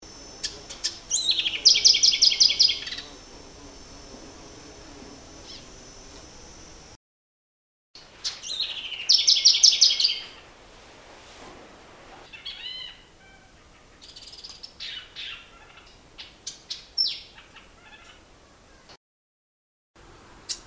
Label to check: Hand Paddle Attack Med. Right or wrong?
Wrong